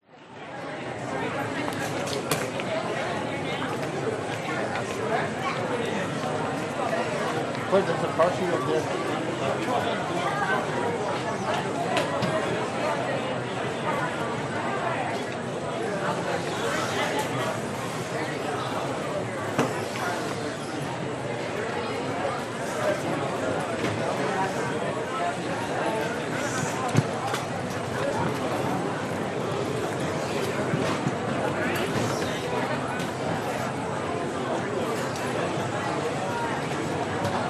USA LAX Airport United Terminal 7 Baggage Claim People Walla